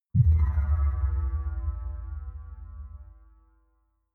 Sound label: sci fi bell bass